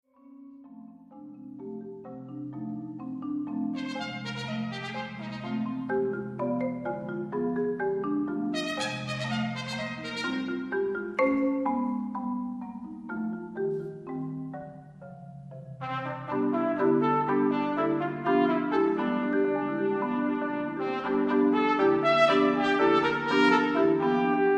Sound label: Tpt Sonata